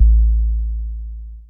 low sub, kick; Heartbeats

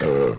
burp short

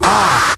"ah", scratch

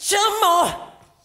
"shamone", SUNG; ooh ahh

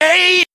ay SUNG

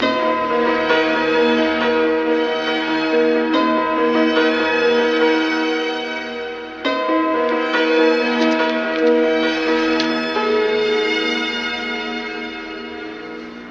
piano, Sad

